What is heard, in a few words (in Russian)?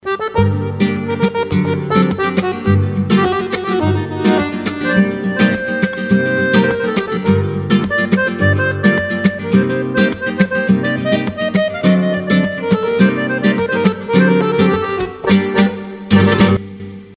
garmonika i gitara